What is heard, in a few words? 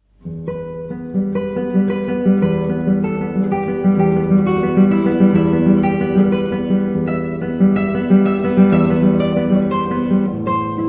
guitarist; glasgow